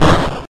Smack Down; pokemon reborn e19 2022

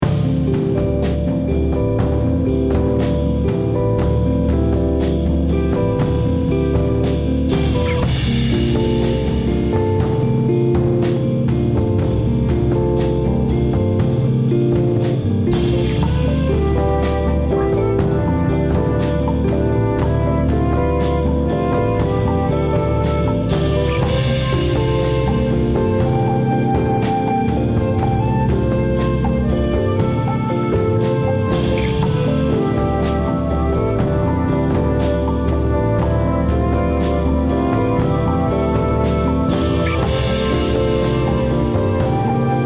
World Loop

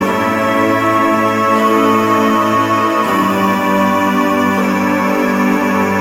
No Rhythm; Cookie Run; Snow Fairy Stage Bgm Shorten Alternative

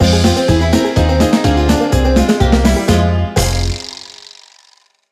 Sting Sad

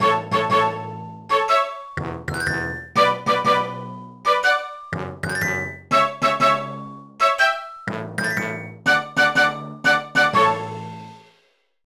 Music Show Jump; Riding Academy 2; nds

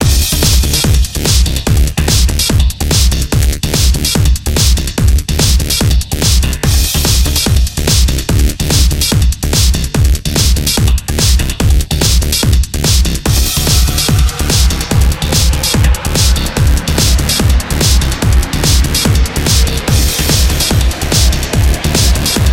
Boss Music; Shatter

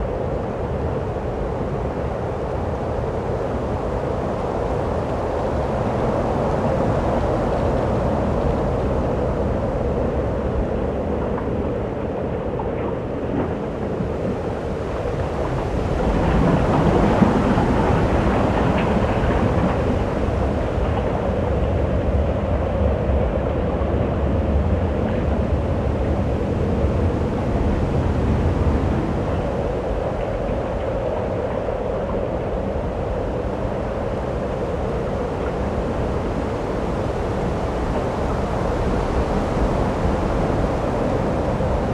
Atmo Holy Land

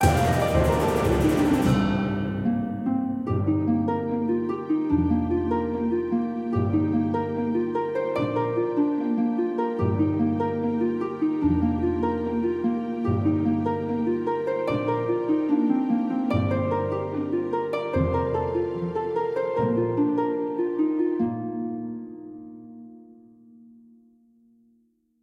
Lose Music; frozen free fall snowball fight 2015 ps4

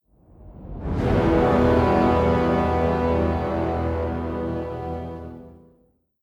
Music Jingle Quest Failed

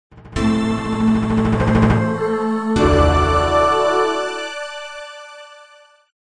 Prayer level up

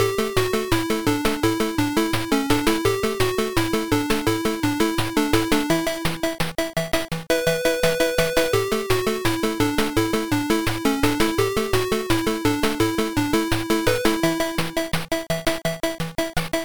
Horizontal Scrolling Cave; Golvellius; SMS

